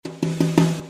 drum

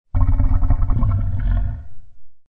crocodile Growl

